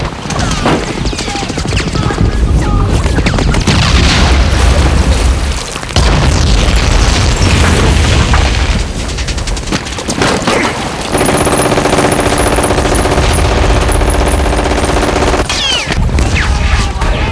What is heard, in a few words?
battle